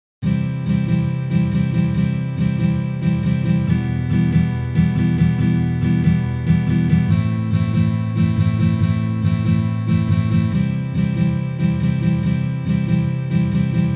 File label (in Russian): boj